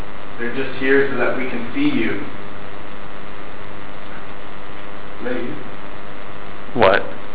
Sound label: Bowling Alley grunt whisper